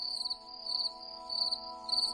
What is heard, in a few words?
Nature Night Sound